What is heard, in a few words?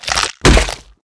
death; grimdevourer